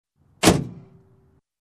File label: Trunk Close